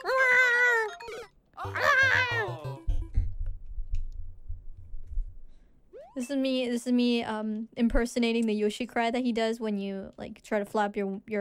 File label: noise; Yoshi